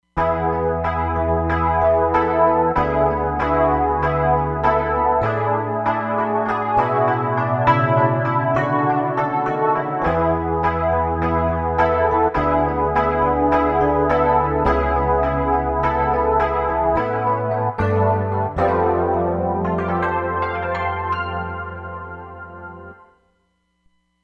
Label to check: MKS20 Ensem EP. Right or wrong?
Right